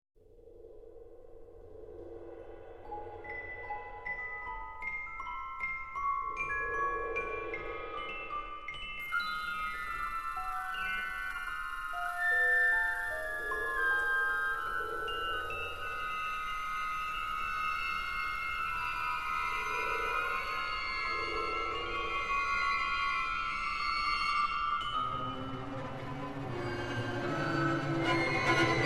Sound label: Eerie Tension; Ren & Stimpy